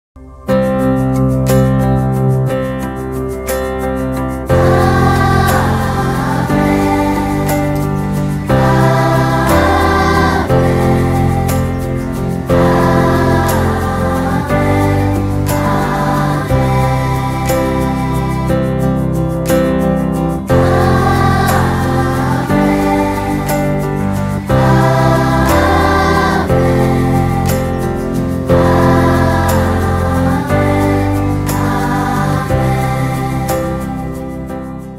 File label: Amen; St Patricks